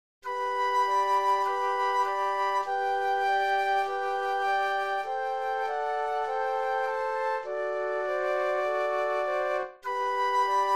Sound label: flute trio; classical